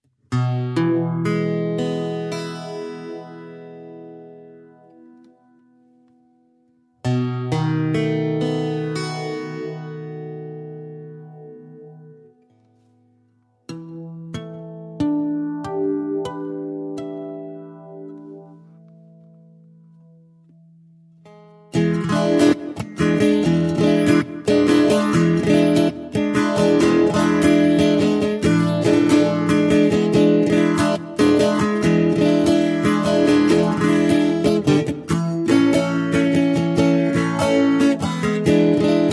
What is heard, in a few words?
Shake the Blues